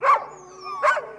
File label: Dog Bark Reaction, husky